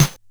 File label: Bent Snare; glitch; Bent Hits